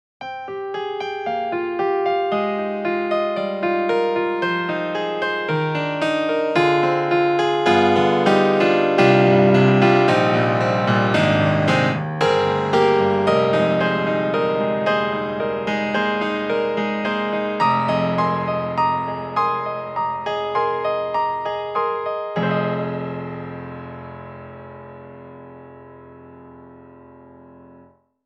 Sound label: Rosewood Grand Dance Piano; Orange Tree Samples; Evolution Rosewood Grand